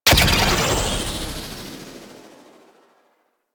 Boost Thruster; Vehicles; Archwing Prime

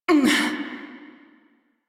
Pain, Female; Railjack Crew; New Loka

